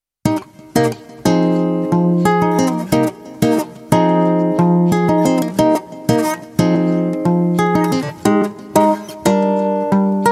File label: Guitar Tuning